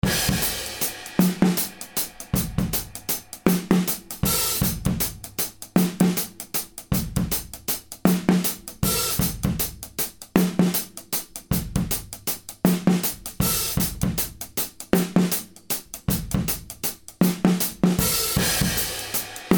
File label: drum loop 157 bpm 6 8